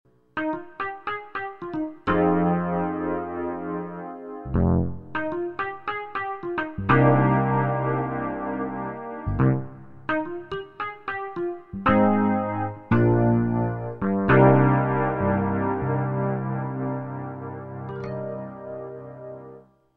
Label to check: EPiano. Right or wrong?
Right